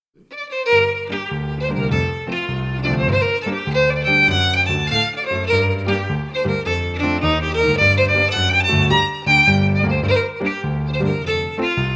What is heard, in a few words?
Jig FIDDLE PIANO; Jink & Diddle Book o' Tunes 2022